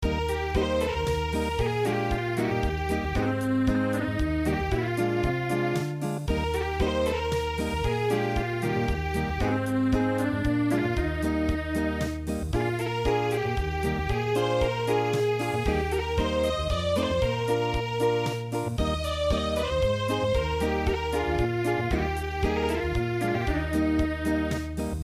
leaf boat Unplugged

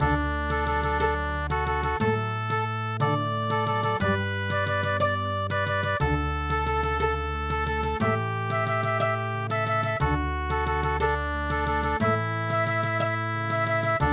bolero; ALLE VOGEL SIND SCHON DA

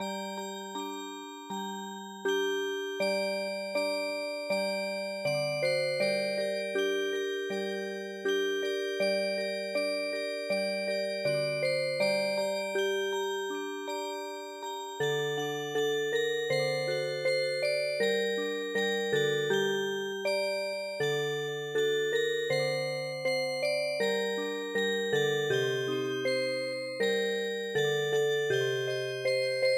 Music Box

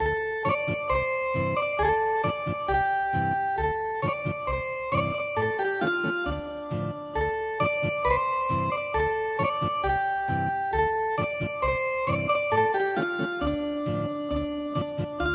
Ethnic Guitar

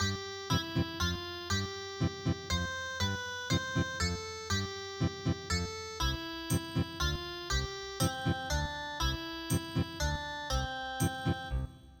lightning bug Ethnic Guitar

